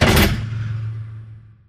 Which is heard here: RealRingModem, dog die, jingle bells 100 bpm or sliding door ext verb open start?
sliding door ext verb open start